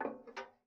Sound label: Ping, Kitchen Toaster